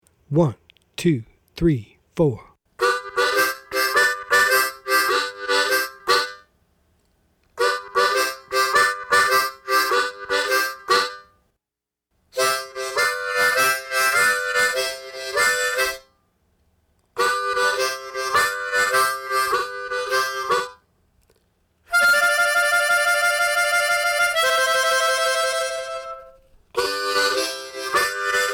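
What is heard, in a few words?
Blues Shuffle with Trills Slow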